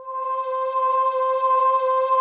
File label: SMOOTHVOX C4, trance; Best Service; Advanced Media Trax Modern Composer; TRANCE 1